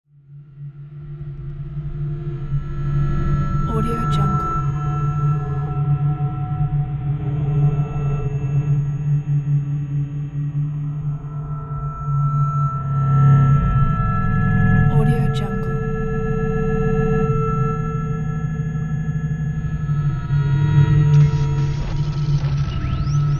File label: astral dimension of scifi; soundmasterfx